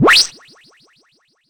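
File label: deep house FX, 125 bpm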